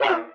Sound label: DOGGY, Anime Sound FX; COMIC